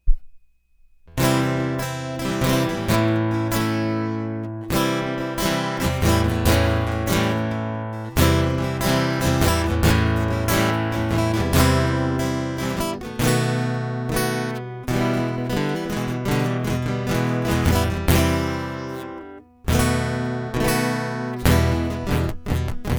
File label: Showdown Guitar Loop; hyper terrestrial